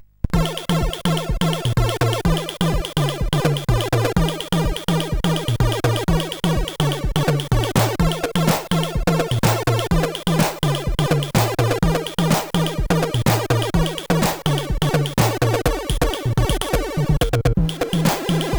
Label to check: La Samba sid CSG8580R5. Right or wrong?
Right